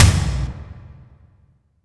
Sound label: toms, cinematic impact, drum